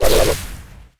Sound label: sfx skill, ZombieSkill SFX; IdleRPG2024